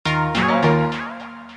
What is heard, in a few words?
Glitch Pop